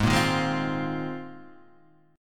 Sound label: Bb 9th Suspended 4th Ab, chord